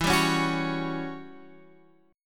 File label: E Minor 6th Add 9th chord